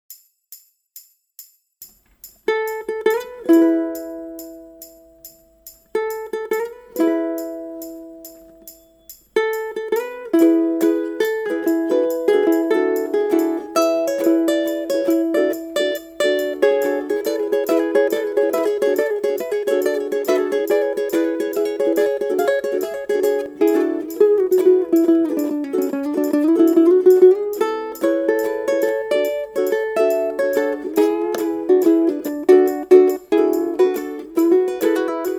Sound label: Solo, ukulele